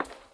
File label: Footstep On Concrete; Animancer; Footstep Events